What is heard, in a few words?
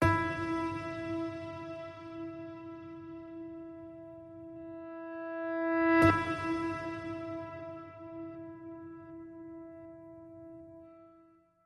Just A Piano Note E